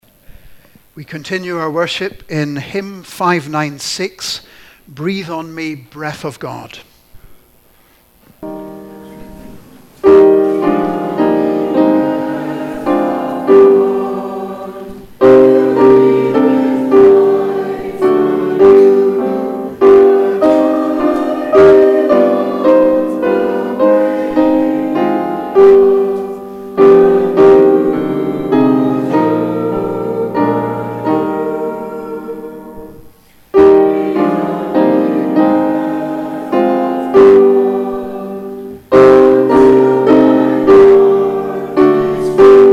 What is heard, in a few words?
hymn; Hymn 596 Breathe on me, Breath of God; St. Mungo's